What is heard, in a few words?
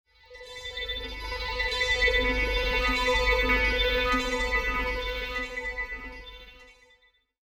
pad